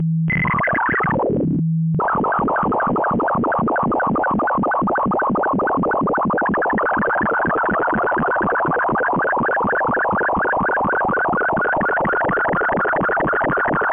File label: MFSK128X2, Sending images over radio ~ What the MFSK mode sounds like; Blog ~ ExploreTruth